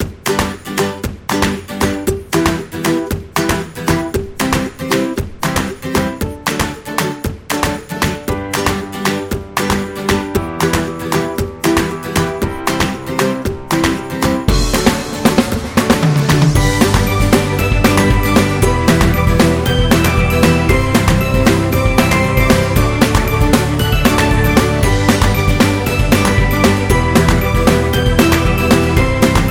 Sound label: Funny Song, ukulele